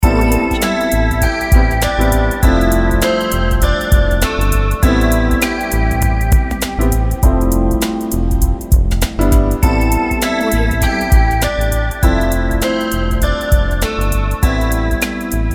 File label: video animation music; waderman